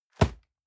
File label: box hit hard dry